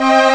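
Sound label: string chord; Amiga Soundtracker Sample Packs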